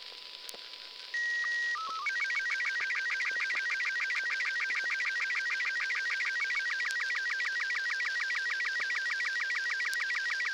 arissat sstv blue

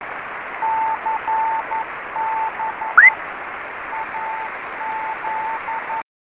compass test beacon

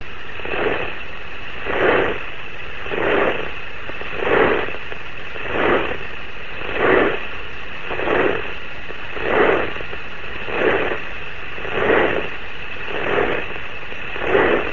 raft spin

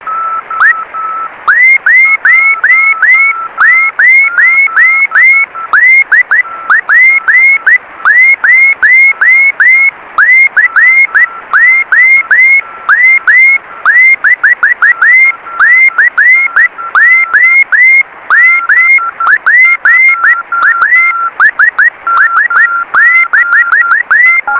compass test beacon

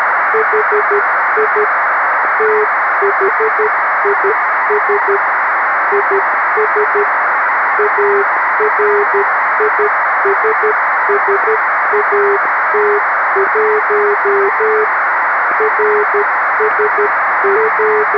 cw bpsk; arissat

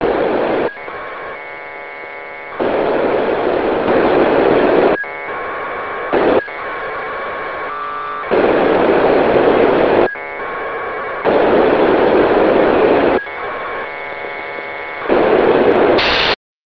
packets

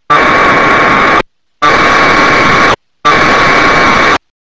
compass afsk

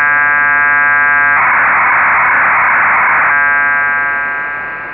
warbler test

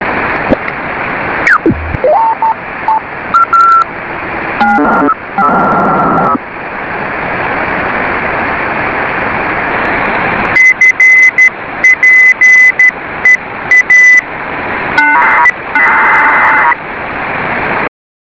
beacon

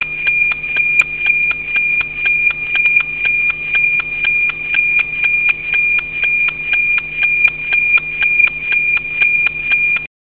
noaa sig

